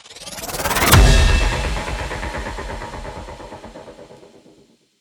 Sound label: HITS, Cinematic Sound Effects